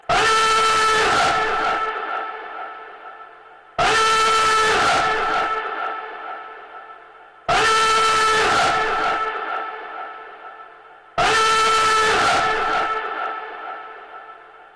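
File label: siren - nuclear alarm; sirena - yadernaya trevoga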